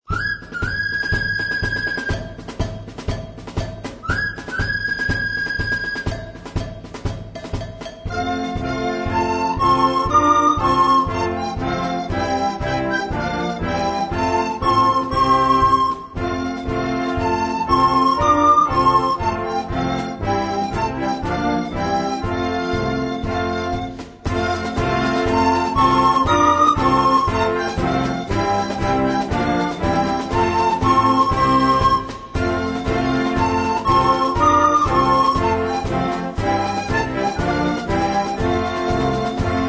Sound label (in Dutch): blokfluit; Railway Traffic